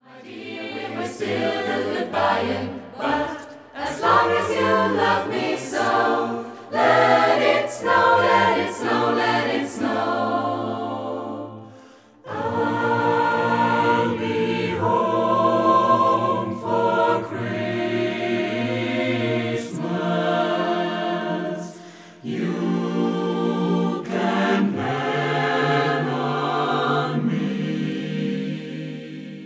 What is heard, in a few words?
Holiday Jazz Trio